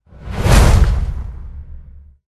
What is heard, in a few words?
Boss Dwarf Attack; Dwarves